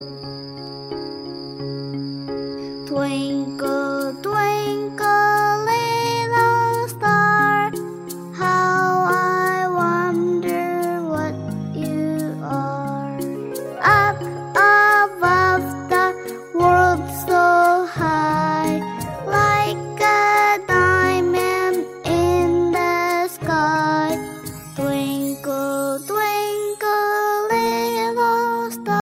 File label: kids poem ringtone